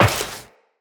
Step Metal Perforated, Footsteps, Walk; Tenno

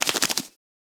Catbrow Foley Idle Head Shake; Warframe

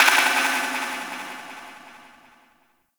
Distant Metal Ronks; Warframe; Corpus; Railjack

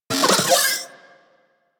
Vocal Detonate; Warframe; Corpus; Mini Moa

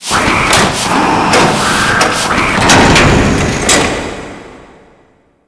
Dojo Shutter Door Close; Clan Dojo; Orokin

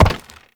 Step Wood, Movement; Uriel; Warframe

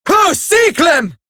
surprised, female; en; Eximus Grineer; Battle Barks

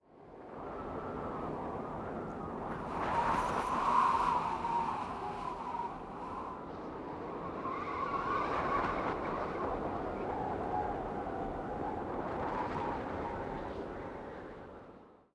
Courtyard Intro Ambience; Warframe; Cinematics; DuviriQuest

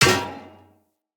Path Lantern Impact, Hanging Lanterns; Dendrit

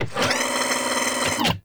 Foundry Motor Forward; Liset Machines; Tenno

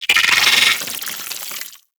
Arachnoid Wraith Vocal Patrol; Warframe; Corpus

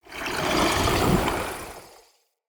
Staff Caster Proj Whizz By; Weapons; Staves; Dendrit Staff Caster

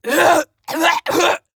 Coughing; Pacifist; Warframe; Evacuation

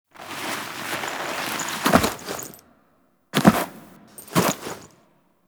Foley Step Turn; Parvos Lich Intro; Cinematics

